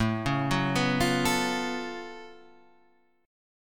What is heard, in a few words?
Gb Minor Major 11th A